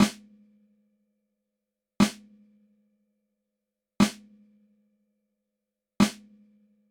snare dual mono 20ms delay, Dual Mono with right delayed 20 ms; Haas effect